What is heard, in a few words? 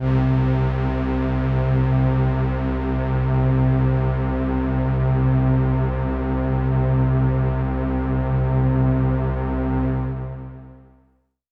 Pad C, Synth Prophet One Shots; Electronisounds